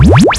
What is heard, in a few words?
laser